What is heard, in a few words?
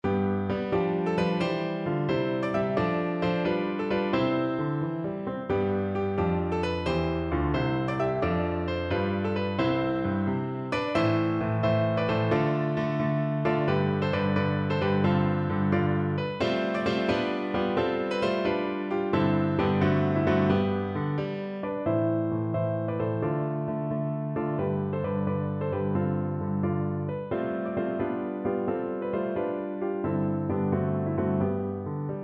playford sellengers round piano